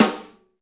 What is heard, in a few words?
Pop Snare; Snares